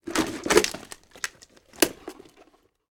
gas mask off; Original TK Sounds

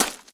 gravel step; Original TK Sounds

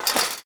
fence step; Golden Crayon Sounds

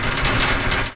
bike grate; Golden Crayon Sounds

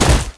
rocks hard land; Original TK Sounds

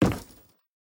wood step; Golden Crayon Sounds

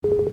computer beep; Business skieme Beta 1

